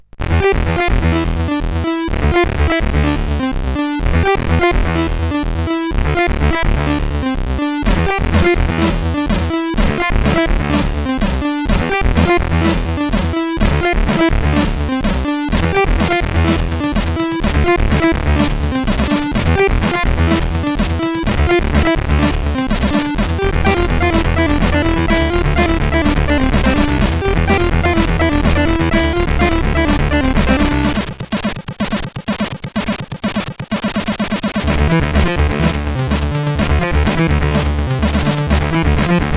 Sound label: techno, CSG8580R5; sid